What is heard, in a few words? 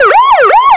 police